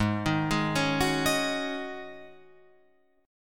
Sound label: Ab 7th Suspended 4th Ab down Guitar Open F, Chord; Ab7sus4 arpeggiated